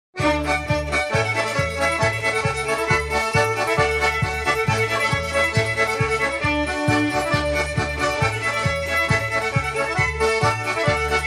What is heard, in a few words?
polka; Rooslaiu polka